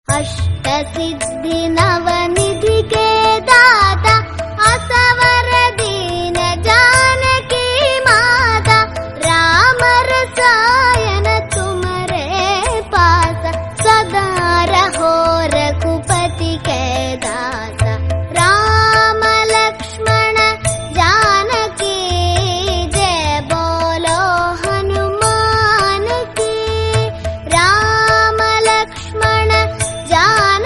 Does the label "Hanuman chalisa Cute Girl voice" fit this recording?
Yes